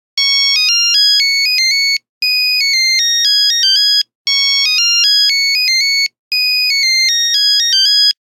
Get cool, Nokia Buzzer; Windows Phone apps

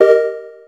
electric, keytone

